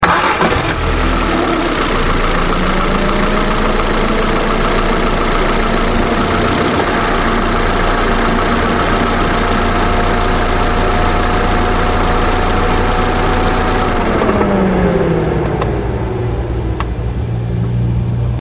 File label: Ford Fiesta Ignition Air Cond Fan Outside